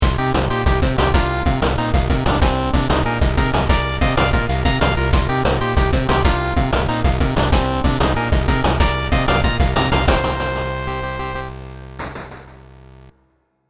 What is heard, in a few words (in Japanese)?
ペダルポイントあり